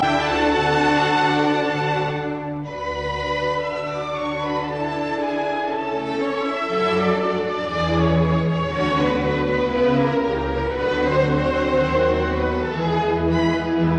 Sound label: Cello Concerto No 2 in D major Hob VIIb2 I Allegro moderato; Bruckner; Carl Schuricht